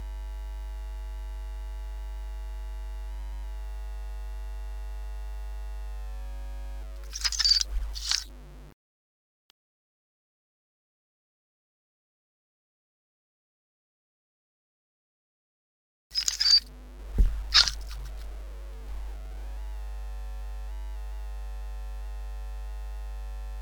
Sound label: Creaky